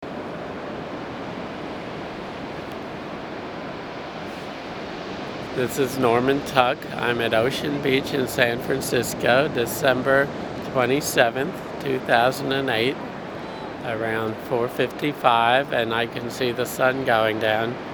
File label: OceanBeach; Ocean Beach San Francisco, CA 4:58PM